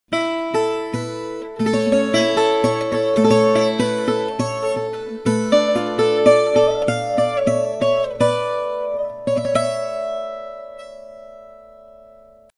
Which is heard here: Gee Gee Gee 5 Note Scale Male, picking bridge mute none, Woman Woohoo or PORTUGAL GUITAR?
PORTUGAL GUITAR